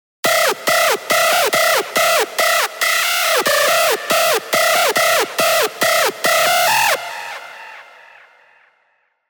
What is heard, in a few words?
screech; Music Infection; Revealed Serum 2 Screech Pathogen